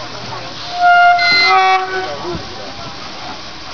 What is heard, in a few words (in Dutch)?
draisine hoorn